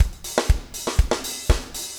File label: 4 on floor 120, loops, 120bpm